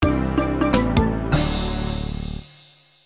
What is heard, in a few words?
level failed; phone; beat the mole